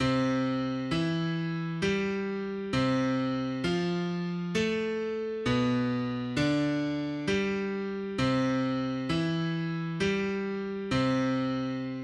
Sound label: sing midi c